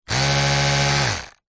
household electric toothbrush run dry static short close up; zapsplat